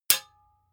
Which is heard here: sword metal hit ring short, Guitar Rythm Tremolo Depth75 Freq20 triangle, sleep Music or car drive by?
sword metal hit ring short